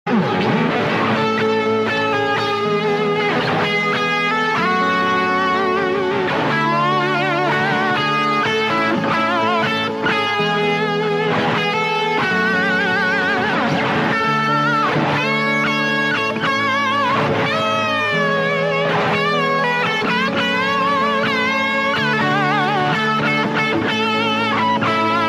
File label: guitar ringtone